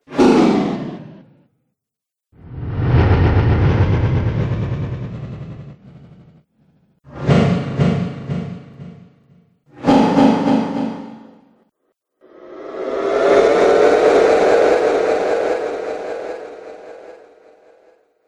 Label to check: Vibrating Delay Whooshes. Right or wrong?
Right